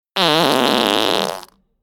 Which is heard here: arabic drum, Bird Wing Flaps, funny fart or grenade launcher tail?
funny fart